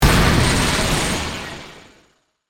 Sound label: Burst Fuzz, Distortion; Production Element, Imaging Element, Accent, Transition; BlastWave